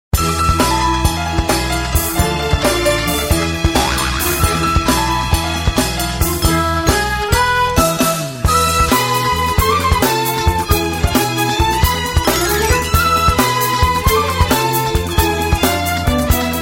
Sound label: Happy Hours Piano